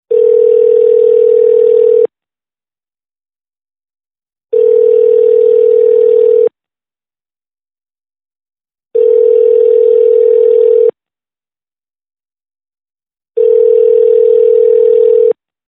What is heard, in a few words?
calling sound